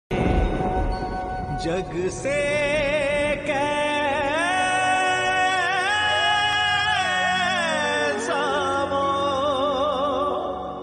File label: radha krishna sad